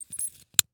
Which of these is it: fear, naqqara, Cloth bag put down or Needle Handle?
Needle Handle